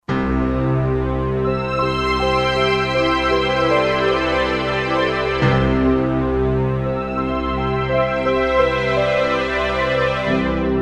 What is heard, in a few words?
Pyanni String, Roland SR-JV80-16 Orchestral II